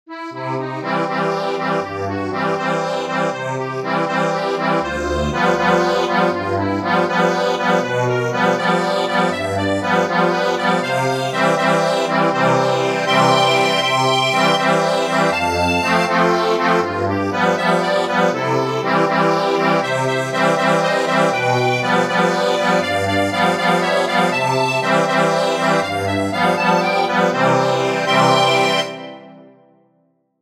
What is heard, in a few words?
accordion